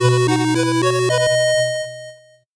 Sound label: 8bit jingle fast